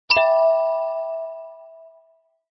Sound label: Default message, chat; wp live chat support